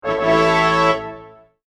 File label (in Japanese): ジャジャーン